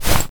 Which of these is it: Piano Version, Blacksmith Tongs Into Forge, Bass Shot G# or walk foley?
walk foley